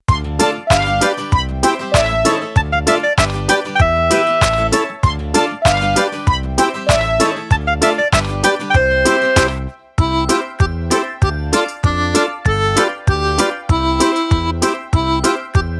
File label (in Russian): karaoke